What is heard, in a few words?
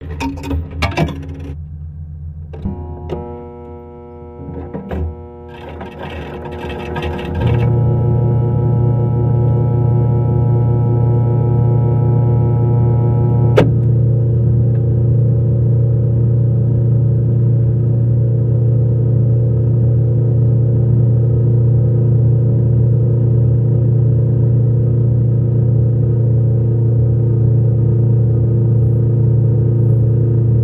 Ambiance Machine